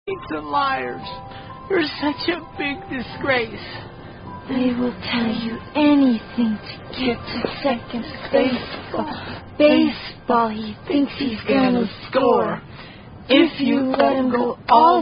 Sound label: Hand Clapping Rhyme; From Movie ''the Hot Chick''